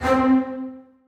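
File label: basses staccato c3; Sonatina Symphonic Orchestra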